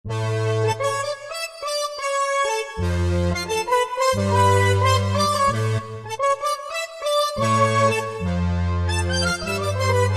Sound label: 2 voices; Korg MSC-12S Synth 3